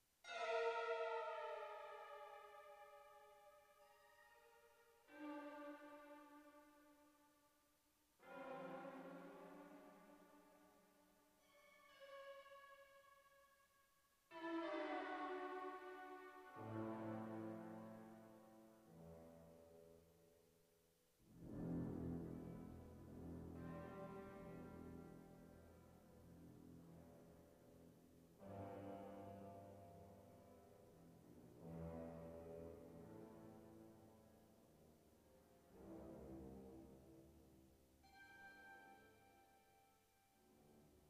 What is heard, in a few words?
horror raw chilled fingers; juno g